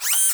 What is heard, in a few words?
Panel Down